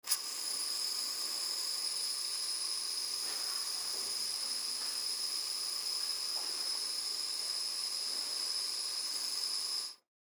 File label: Oxygen Tank, Oxygen Tank Release Air; Oxygen Tank Release Air Long; Hospital Sound Effects; Emergency